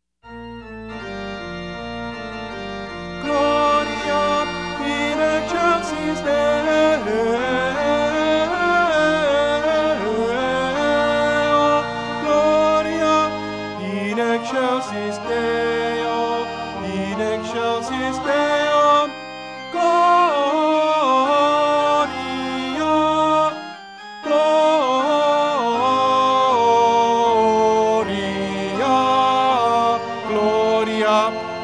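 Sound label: Fanfare Christmas, Tenor; Shaw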